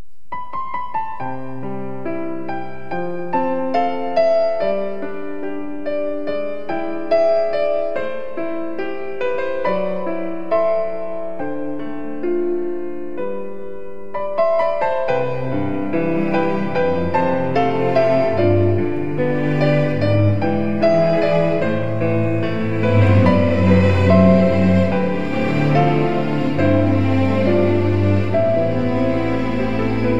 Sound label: piano